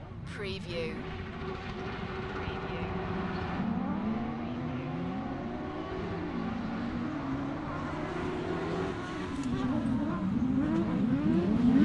Car racing on grass distant perspective; beanstalk audio

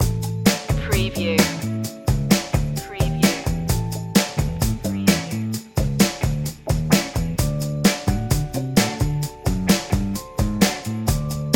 funky rhythm

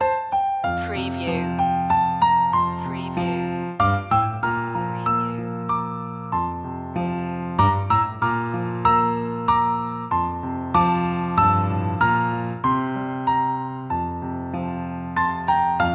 the first noel piano loop; media music now

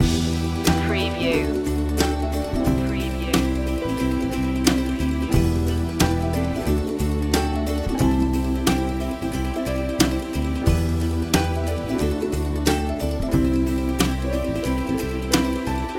inspirational ukulele loop

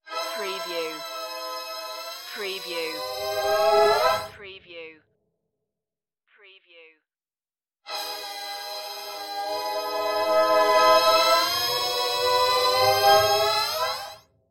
creepy organ risers

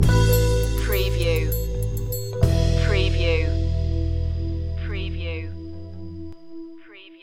funkin is easy stinger

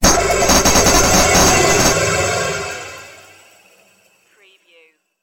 space pinball ricochet

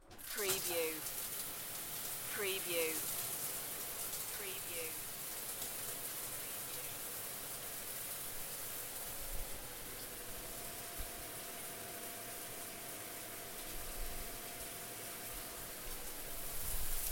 Electric Shower; beanstalk audio

